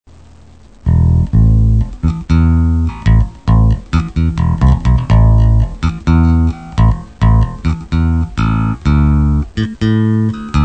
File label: Bassgroove